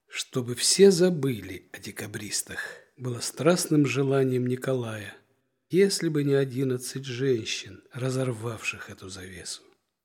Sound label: mazurka